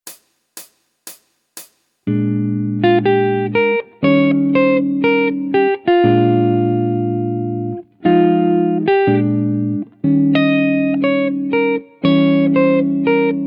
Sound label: Jazz Chord Melody, Guitar; Guitar Techniques 2019 Issue 297 July